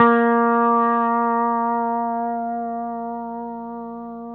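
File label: pedal steel A#3 left; PEDSTL NOTES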